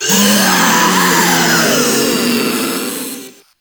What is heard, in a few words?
Proto Infested Ancient Death Vocals; Warframe